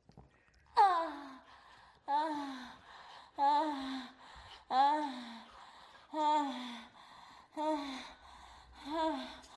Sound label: moan, moans; The Witcher Hunt